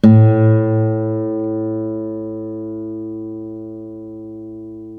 ACC FG A, Acoustic Guitar; East West; Steve Stevens Guitar Samples Collection

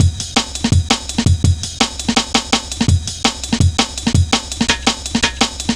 jazz ridez, drum loops; Zero-G Jungle Warfare 1, 2 & 3

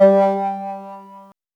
LEAD R; AMG - Vince Clarke Lucky Bastard

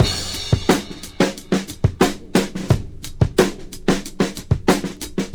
2bar, breaks; DOA breaks; HitorMiss

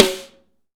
SNARES DRY; Real Mega Drums

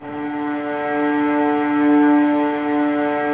STR VAS D, Violas, No Vib; Miroslav Vitous

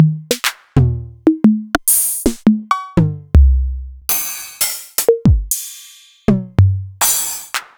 Novation Drumstation

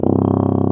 C Tuba; Solo Brass

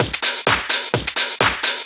Discohouse Loop 128 BPM

